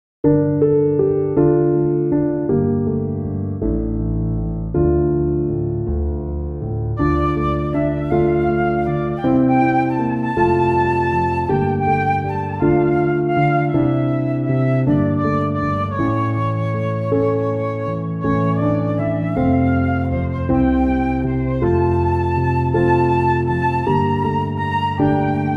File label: INSTR